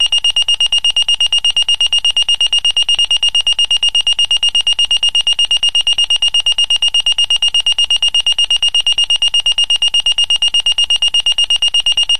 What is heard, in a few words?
pager tone, sms; ringtones